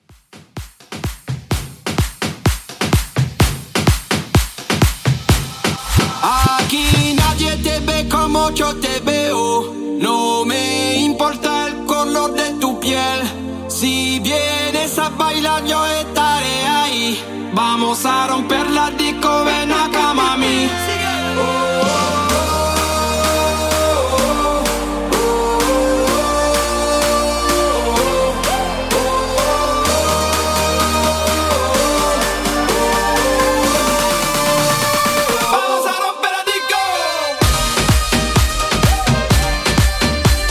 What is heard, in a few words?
Moombah Mixshow 127Bpm